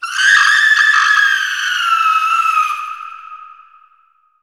animal banshee scream; SoundsCrate

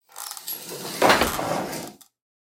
Moving Coffin; SoundsCrate

